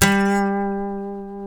A, funk guitars; Spectrasonics; Hans Zimmer Guitars VOL-1; TAKA FUNK

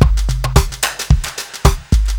FLOATING LOOP, breakbeats; Crypton - DrumBase5000

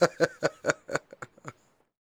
LAUGH; Spectrasonics Vocal Planet; Jazz FX

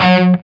G4 SOLDANO ROCK, Guitars; Spectrasonics; Hans Zimmer Guitars VOL-1

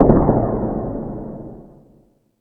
Tool Kit, Drum Machines

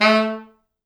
A2, Pop Brass; Best Service ProSamples vol.19; AKAI

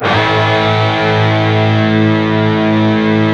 PRS, Guitars, PWR SLID

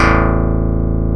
DIGI BASS; DIGI BS

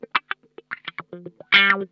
SCRAPE, Funk Guitar; Zero G - Funk Guitar

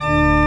CHRCH, CHURCH ORGAN; Roland - LA Composer vol. 1

